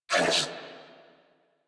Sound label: Pain, Female; New War; Narmer Grineer